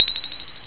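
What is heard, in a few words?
save point; chrono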